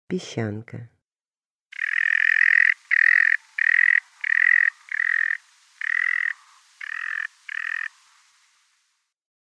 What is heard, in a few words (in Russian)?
peschanka golos